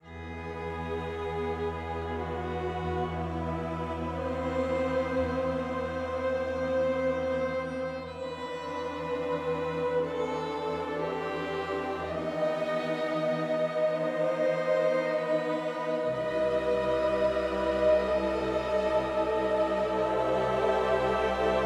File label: Strings and Choir; Hollow Knight